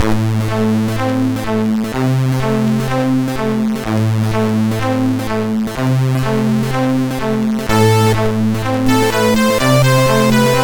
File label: Ruski voz, sid MOS6581R4